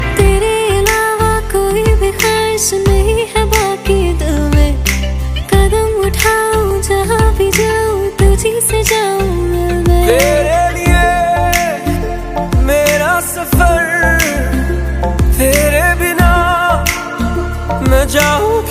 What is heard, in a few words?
Ringtone Female Version